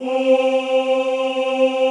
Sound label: OOH C3, Classical Choir; Best Service; Extended Classical Choir